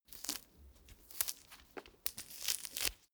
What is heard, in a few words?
mandarin peel sequence